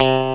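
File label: Electric Guitar (clean) (C2); X-Wing; X-Wing Patches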